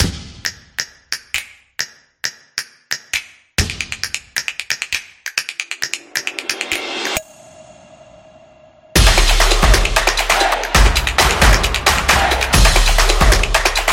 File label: Creative Music